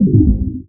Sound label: Menu Synth Tone